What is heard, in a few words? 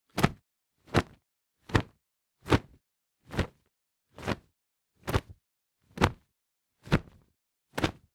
punch cloth series